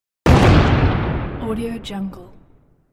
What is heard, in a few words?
explosion